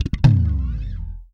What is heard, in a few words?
TRIPLET, Bass; Sampleheads; Will Lee Bass Library